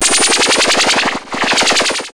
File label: SYN FX; E-Lab; X Static Goldmine 1